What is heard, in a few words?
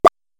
jump